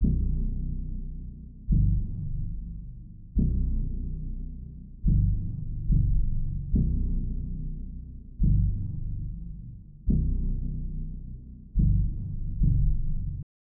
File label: 143 BPM B Min Bass; Cymatics; 2022 Melody USB Expansion; Charge